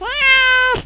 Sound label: miaow